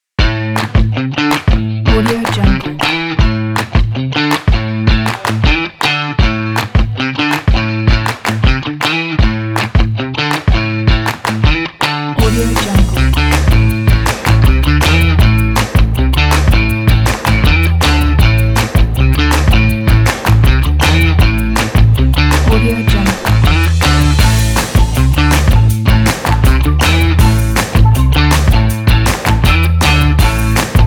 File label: funky